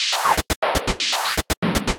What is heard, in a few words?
Whitenoise; Vector Wave Sequences; Ableton; Retro Synths; Components